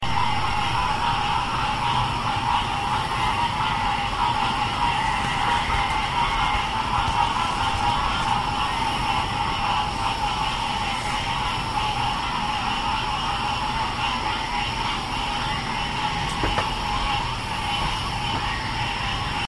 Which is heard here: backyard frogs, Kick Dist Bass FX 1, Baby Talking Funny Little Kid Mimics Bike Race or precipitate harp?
backyard frogs